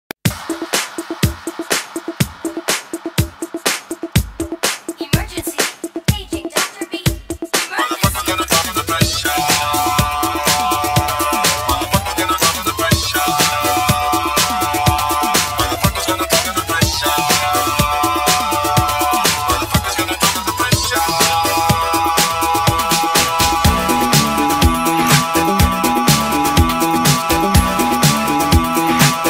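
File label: disco mashup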